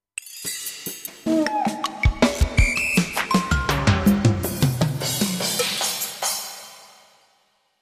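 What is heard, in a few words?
Standard Kit, drum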